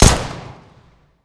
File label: p228 fire; weapons; csgom; cstrike